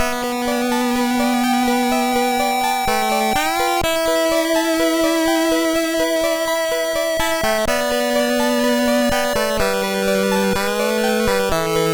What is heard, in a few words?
exploding fist; FredEditor